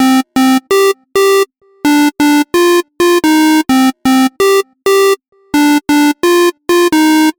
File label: synth